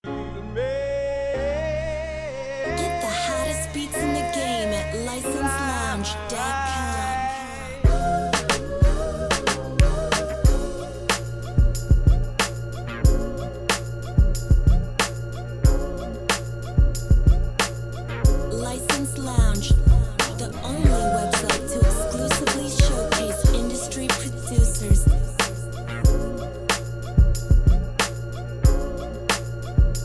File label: 92.3 BPM